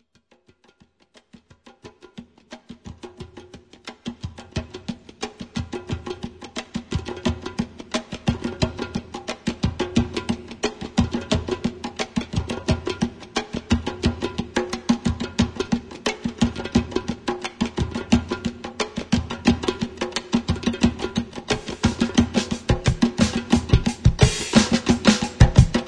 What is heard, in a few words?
steel dub